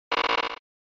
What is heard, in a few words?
Fighting, Electricity; SNES